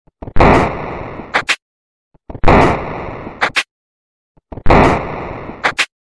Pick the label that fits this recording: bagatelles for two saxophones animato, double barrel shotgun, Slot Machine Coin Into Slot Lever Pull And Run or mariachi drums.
double barrel shotgun